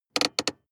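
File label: sports car interior handbrake pull medium; verkehr-transport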